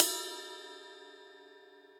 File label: RIDE 22 cup tip; RIDE PE TW 22 cup tip; Ableton; Factory Packs; Drum Booth